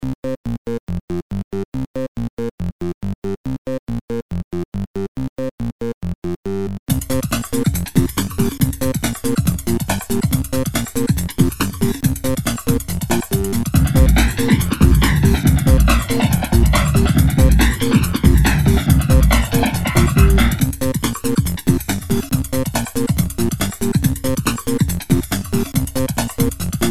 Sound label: Drum Thing